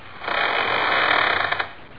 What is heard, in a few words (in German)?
treppe